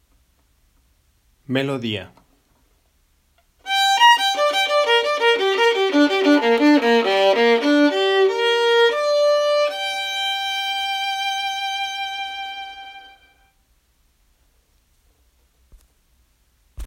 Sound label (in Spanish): Melodia; Mozart